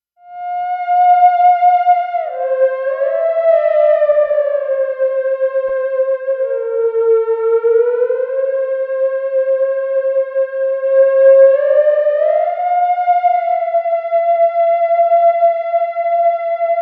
Sweet melody played multiple times for a haunting unison chorus, Miniature Theremin; ringtones